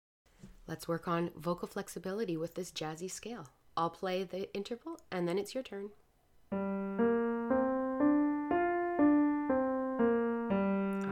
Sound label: jazz